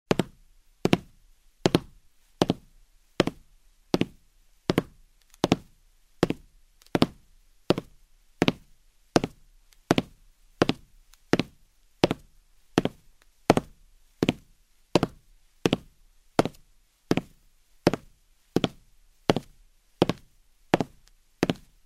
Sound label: Steps on the wood floor in shoes